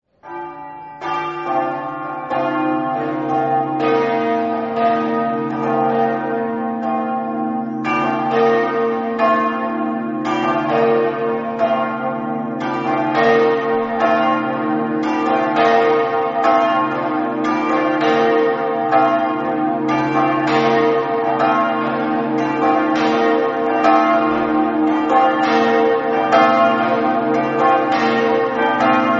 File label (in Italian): codroipo, campane